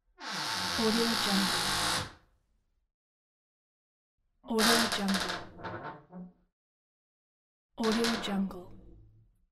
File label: creaky doors sound pack